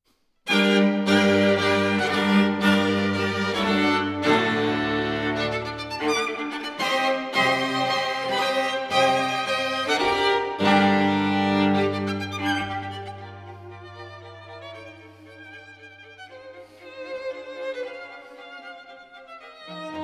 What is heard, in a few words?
String Quartet in G minor; No. 1 op. 27 - Intermezzo. Allegro molto marcato - Piu vivo e scherzando